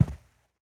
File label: Footsteps Sneaker Carpet Walk